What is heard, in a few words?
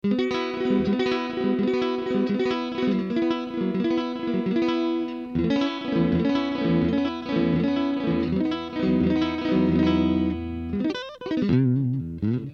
CLEAN